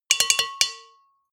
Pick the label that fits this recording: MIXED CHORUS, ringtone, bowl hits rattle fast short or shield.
bowl hits rattle fast short